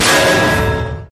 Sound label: Clang